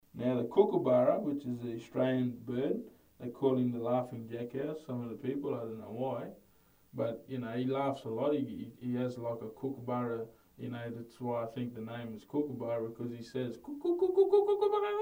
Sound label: Didgeridoo teach